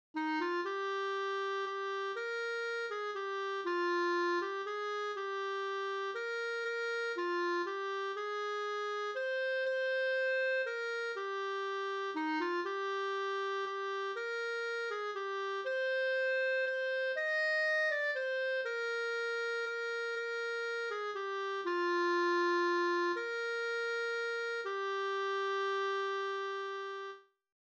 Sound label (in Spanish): Tal como soy Soprano